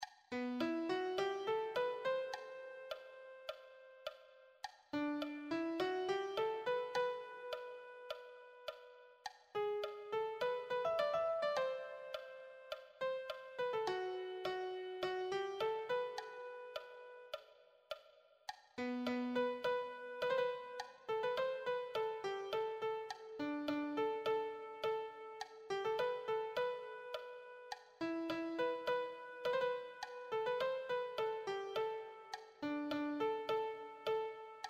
melody

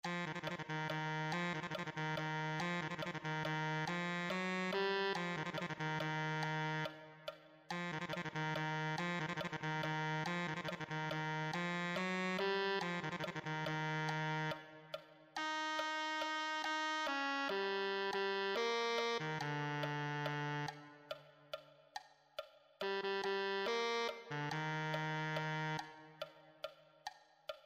tenor saxophone

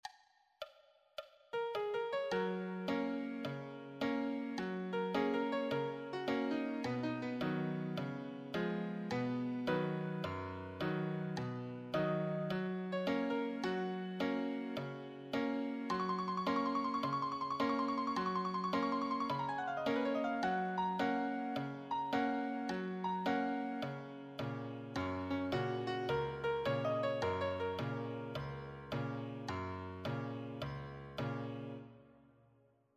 solo, piano

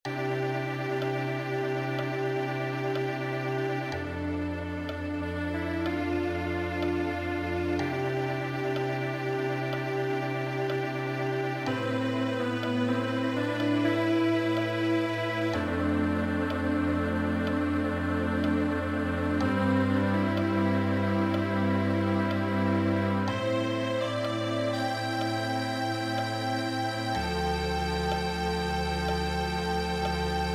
string quartet

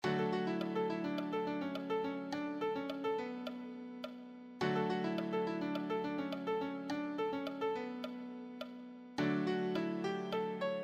easy solo, piano

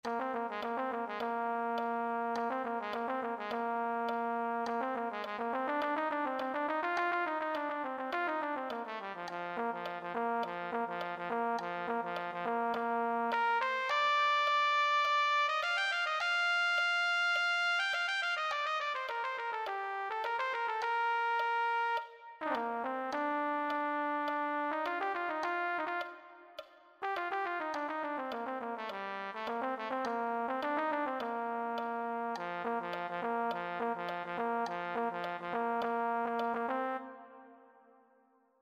trumpet

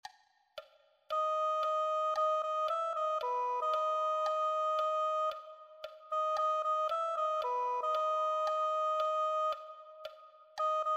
soprano saxophone

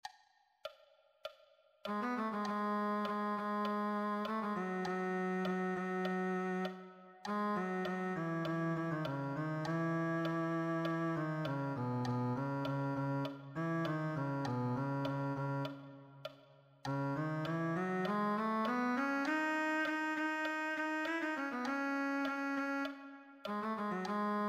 cello

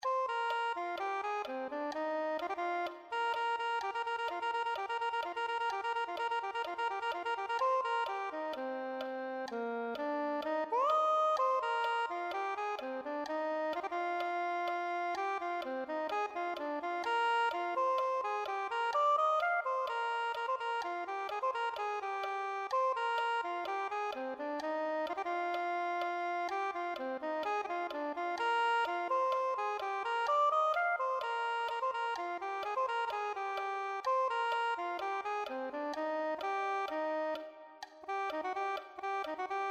soprano saxophone

